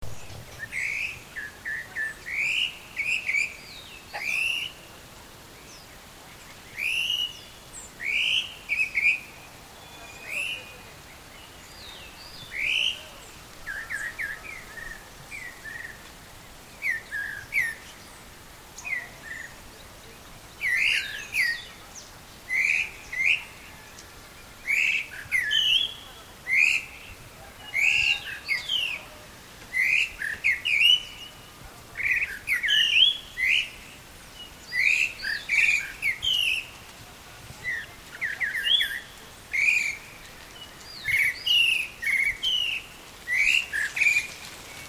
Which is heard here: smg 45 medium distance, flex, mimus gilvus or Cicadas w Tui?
mimus gilvus